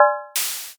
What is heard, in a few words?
basket